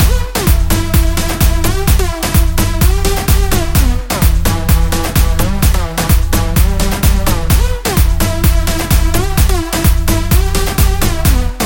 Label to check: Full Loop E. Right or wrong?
Right